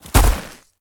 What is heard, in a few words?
creature walk; snowstalker